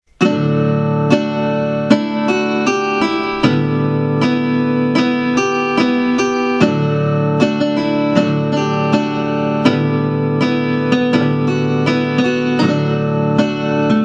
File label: MOVIE MUSIC